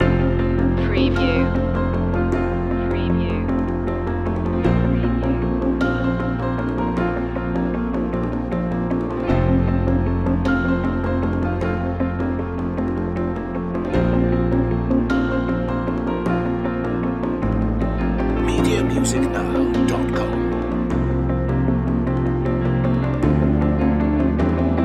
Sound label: sailing to the end loop